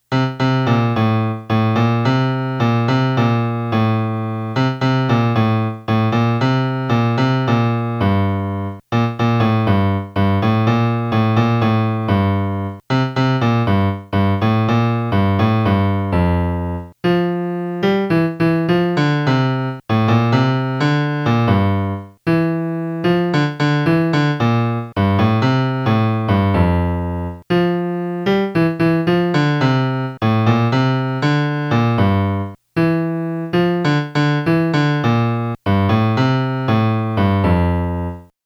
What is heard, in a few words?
melody